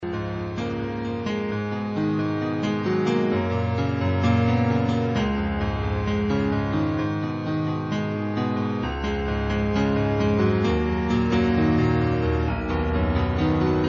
minus na pianino

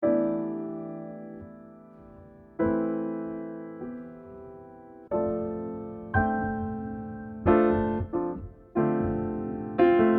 Muzyka dlya chteniya

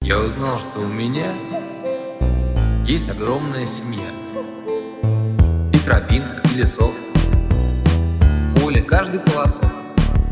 Rington

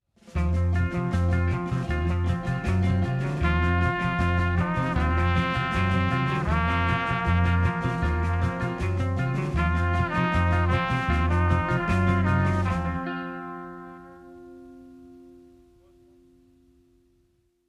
Truba

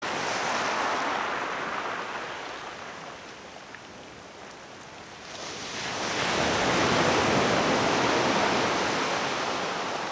Dyhanie morya zvuki zhivoj prirody

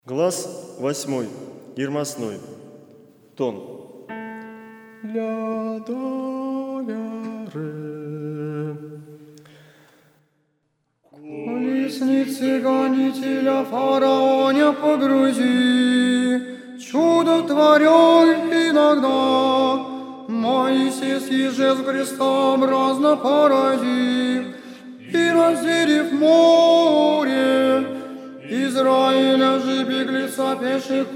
Muzhskoj hor Glas 8 IRMOSNOJ pervyj tenor

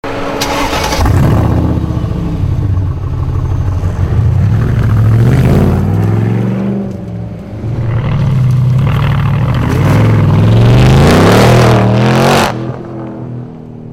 Zvuk dvigatelya Mansory Renovatio Mercedes-Benz SLR McLaren

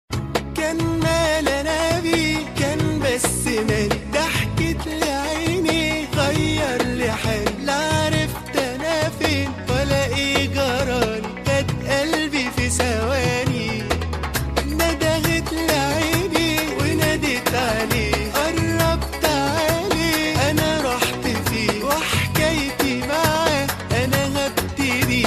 arabskie melodii dlya mobilnogo

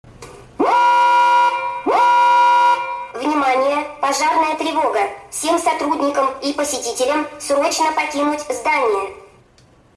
Vnimanie Pozharnaya trevoga nightcore

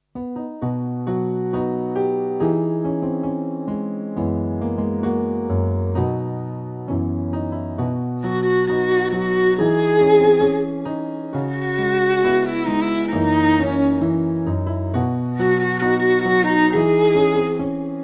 Ryabina karaoke so skripkoj

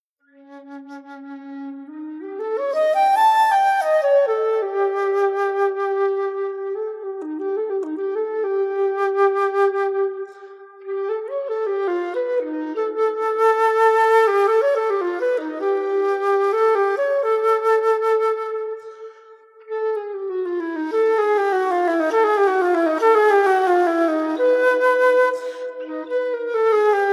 Flejta v rok muzyke

